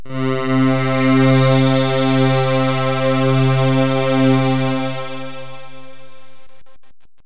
fx synt c2; Terra Sound Library; construction set

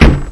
thump; kingarth

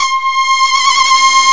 korg woooaaaa; Epic Collection 3, The; Epic Marketing; muzak

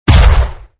EXPLOS; mecha cat destructo

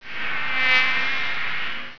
BEE; HONEYCOMB HOTEL; GAMEZONE

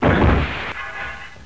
Crashes II; Bible Fun Pack; PARSONS

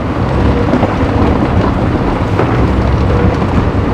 ROAD; CRC2005; CRC 2005 Demo

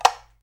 BUTTON

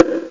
bongo lo; Amiga Special - Software Hits; orchestra; drum

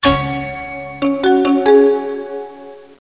Bong; Intel - Imagine the Possibilities - Processor Demos for Resellers Vol 3

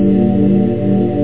string

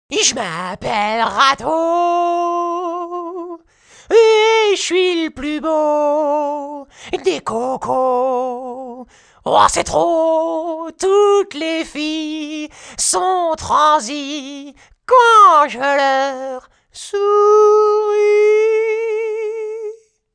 jazz; MOBICLIC 57

